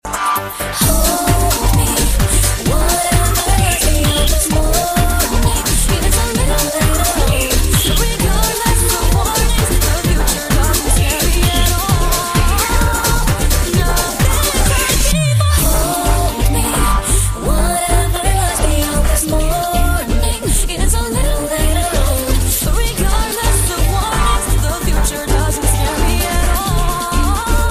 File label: game simple and clean